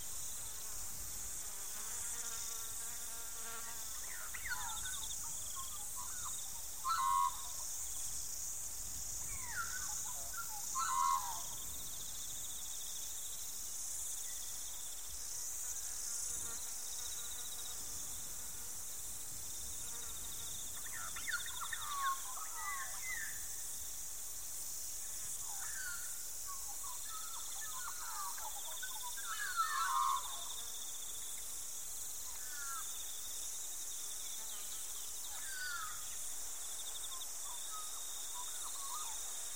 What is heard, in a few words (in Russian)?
bezzabotnoe otdalyonnoe redkoe krasivoe penie raznih ptic